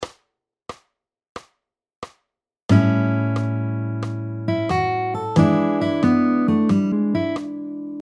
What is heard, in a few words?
blues & R&B style 1-5 lick, key of A, 90bpm